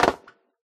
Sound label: metal land; Golden Crayon Sounds